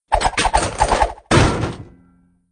fix

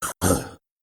cough

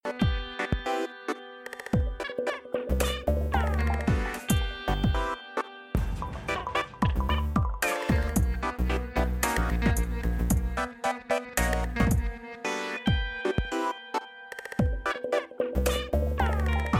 ambiance clothes shop

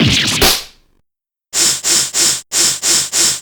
damage full; sasha buckler

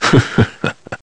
laugh; megumi

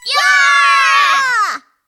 group cheering; monokuma kid

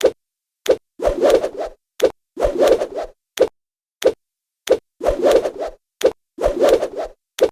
juggle; olivia

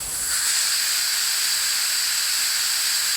soporific gas; zero

